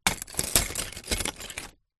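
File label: broken glass bottle pieces crush grind; zapsplat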